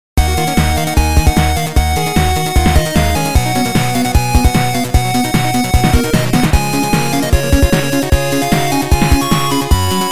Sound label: 8bit Dungeon; MinuteFrontier Android game music